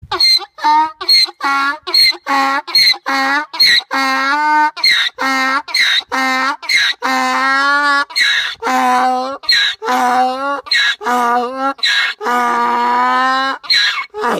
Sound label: Donkey sound; صدای الاغ و خر